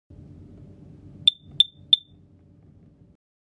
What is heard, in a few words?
Error signal, rauchmelder; Fujian Taisun Electronics Technologies Company Limited; SD-1383